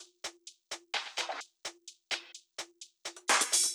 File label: Drum Loop 128BPM FX; VEC5 Drumloops; VEC5 Multi Loops; Loop Elements